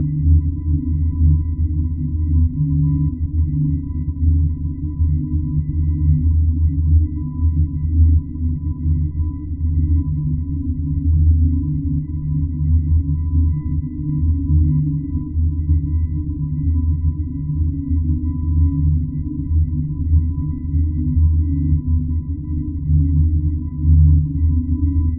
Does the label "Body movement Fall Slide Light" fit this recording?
No